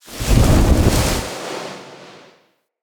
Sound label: Casting Device Projectile Whizz By Heavy; Galene